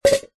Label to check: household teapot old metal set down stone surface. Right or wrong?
Right